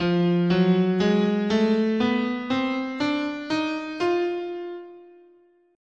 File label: F Diminished Half Whole, scale